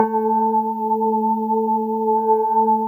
GLASS KO; Synclavier Sampler Library; KEYS AND GUITARS

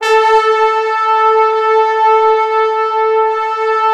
A3, Orchestral Brass; Best Service ProSamples vol.20; AKAI

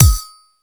MONDO PERC, METALIC DRM; Crypton - DrumBase5000; METALIC DRM2

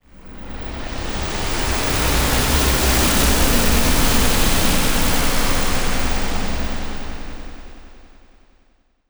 Depth Charge, Weird; Zero-G Ambiosis

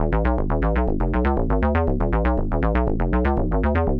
SH101 Arp (120BPM), retro house

